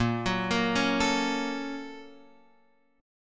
A sharp Minor Major 7th Sharp 5th, down, Guitar, Open F; A#mM7#5 arpeggiated; JGuitar